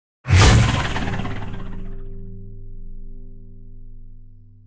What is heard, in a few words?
tank ricochet; Vehicles; Civ